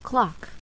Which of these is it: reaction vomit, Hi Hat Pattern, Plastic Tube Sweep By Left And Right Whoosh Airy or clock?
clock